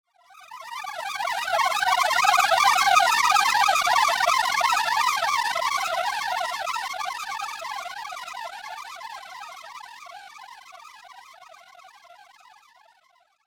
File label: Insect Plague, alien sound effect; Insect Plague - Devils From Another Dimension; Alien Sound Effects